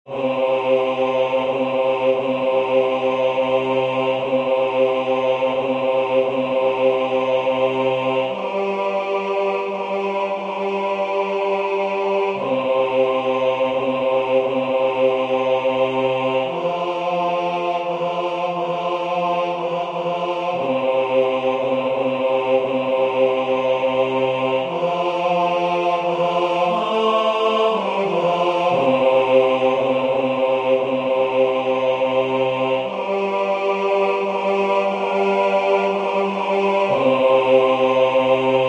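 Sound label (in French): basse, Musique sacrée; Douce nuit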